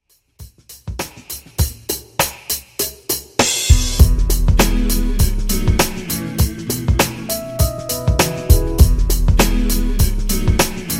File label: 100 Bpm; Dj Intro Outro